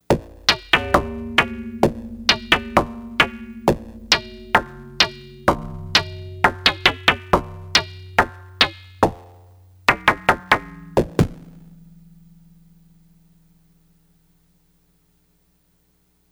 Clockwerks, Yamaha DX100; Factory Patches

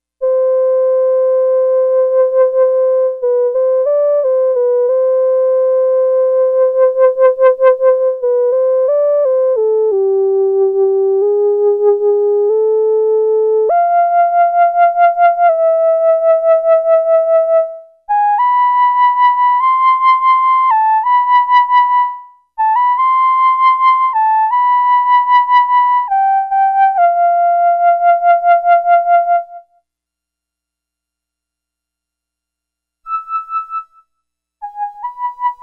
Flute

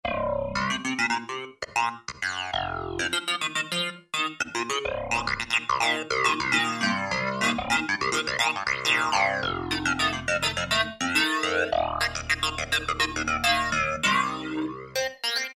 Clavi tube, Alesis QS8; Factory Preset examples

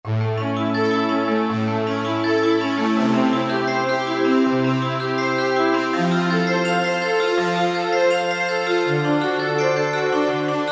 Stringz n Bellz; Roland PN-D50-02

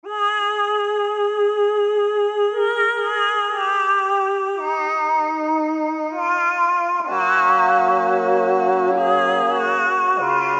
Astral Vox, Korg MS2000; Factory Patches

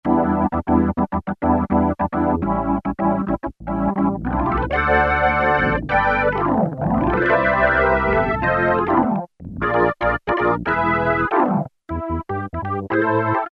TREMOLO, Kawai SX-240

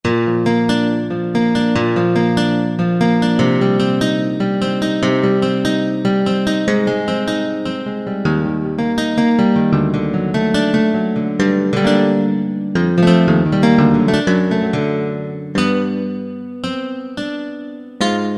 PL Guitar, Yamaha TG77; Factory Presets

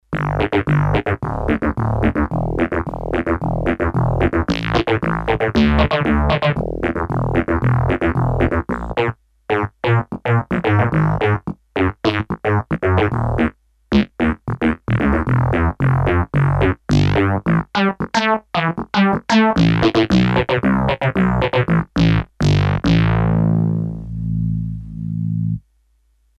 Synth Bass, Korg DS-8; Factory Patches

